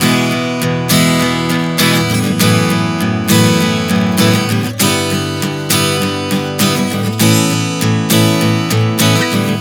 E-F#m11-G-A 100 bpm, acoustic guitar chord progressions